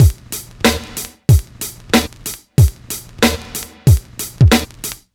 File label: drum, Hip Hop and R&B Drumloops